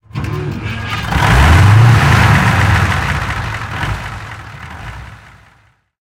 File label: su 100 engine start, tanks; War Thunder